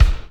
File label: TURBO KICK